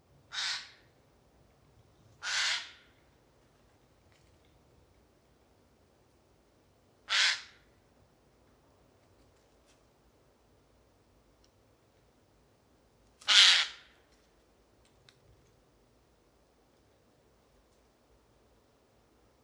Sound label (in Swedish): nötskrika Garrulus glandarius